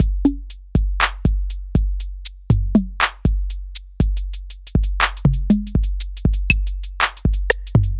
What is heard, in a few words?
triplets